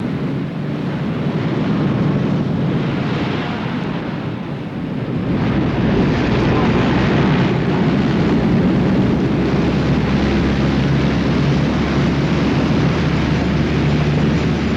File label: B-29 Taxi On Field, In To Mike, Long Out